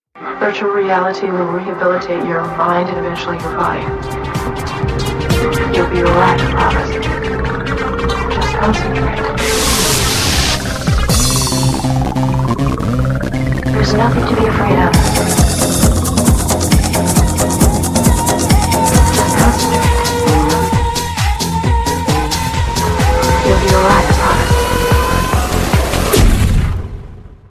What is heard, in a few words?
Trance Remix